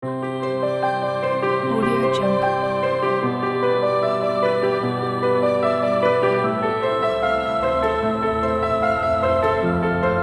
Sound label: motivational inspiring upbeat corporate; oceankids